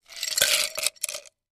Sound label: ice glass, glass clinks